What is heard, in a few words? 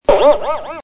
DJ Effects boing boing